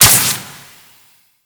Jetpack Thruster C; Warframe; Corpus Jetpack

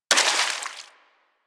Bullet Impact Debris Water

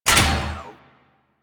Crp Ship Fighter Platform Stop; Ambience; Props; Warframe; Corpus Ship Remaster

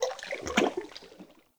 Under City Cavernous Water Lap Rock

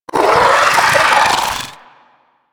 Amalgam Moa Satyr Vocal Attack; Warframe

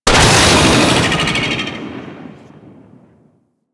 Boost Thruster, Vehicles; Warframe; Archwing Demolition

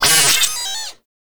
Proto Infested Mutalist Pain; Warframe

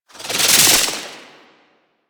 Slinky Movement Rattle; Warframe; Man In The Wall

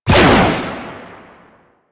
Jet Turbine Pistol Shot; Warframe; Tenno

